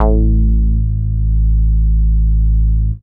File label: moog A; Techno Trance; Techno Trance Essentials